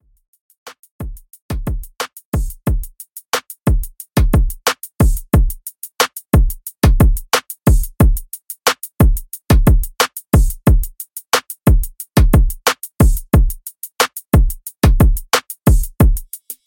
90Bpm; Reload

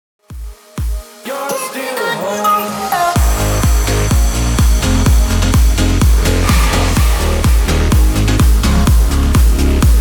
progressive cut 126 BPM

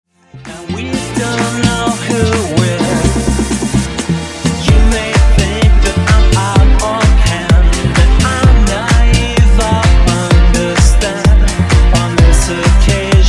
Funk Retro Disco 128Bpm

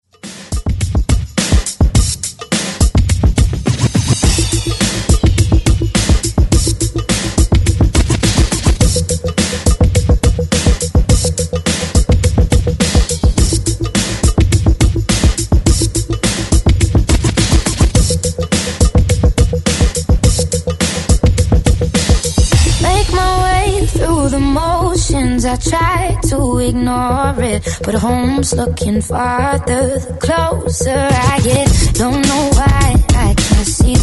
Redrums

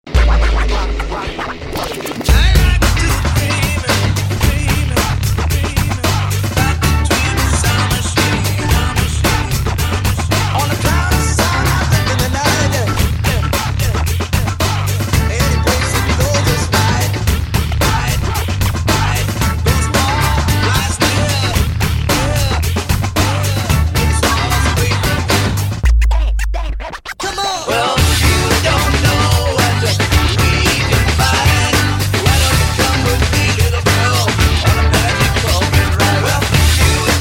Classic 60s ReDrum

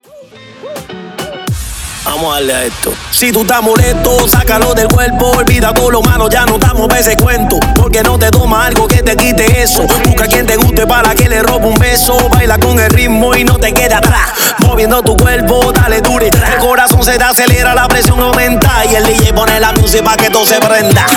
Latino Mashup 105 BPM

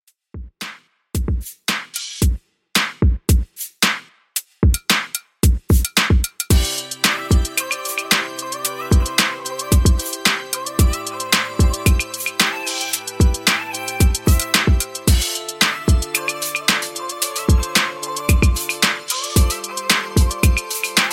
Redrum 112Bpm

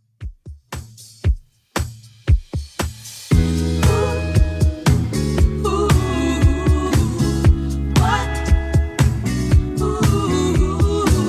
70s Redrum 116bpm

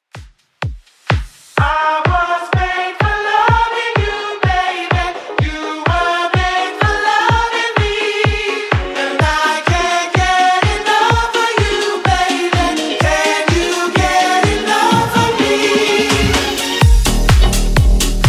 House Redrum 126bpm

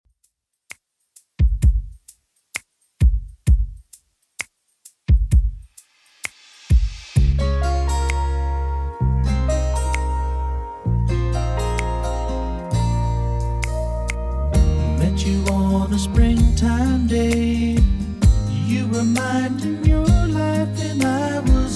70s Redrum 65bpm

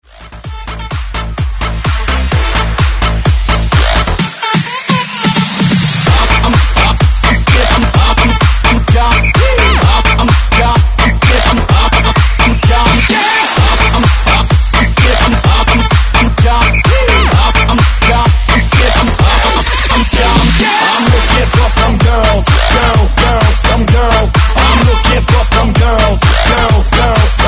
Bounce 128Bpm; DJDOLLS; Redrum; remixplanet

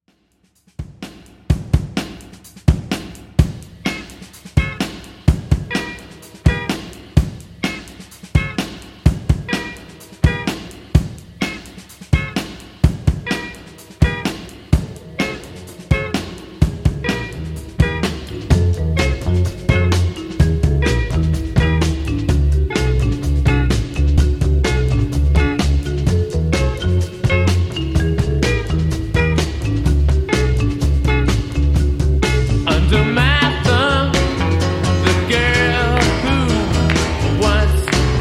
Dj Intro Outro 127Bpm

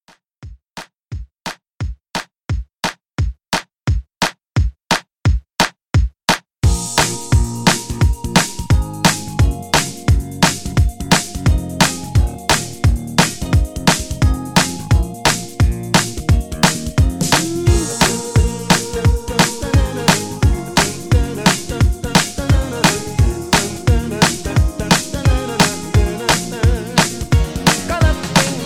80s Re Drum 87

